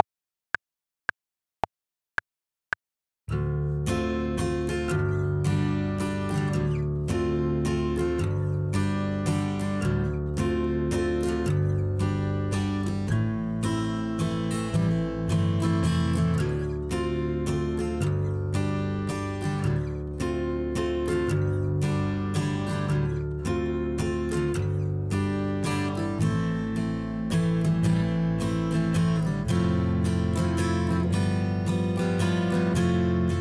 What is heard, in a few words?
Guitar and Subtle Bass